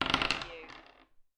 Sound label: Dice Throw Two Dice On Wood Table